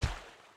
landfill small; Factorio

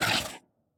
biter roar mid, creatures

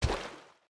landfill medium; Factorio

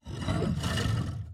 worm breathe, creatures

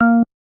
bass, programmable speaker

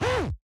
spidertron leg

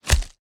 axe meat; Factorio

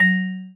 vibraphone, programmable speaker

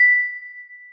celesta, programmable speaker

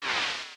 robot die vox; fight

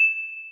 celesta, programmable speaker

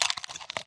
spitter walk, creatures

